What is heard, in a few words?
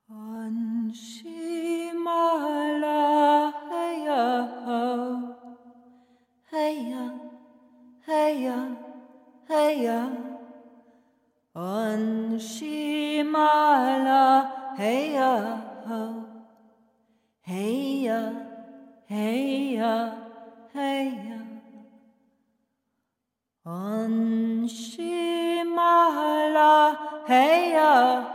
Morning Song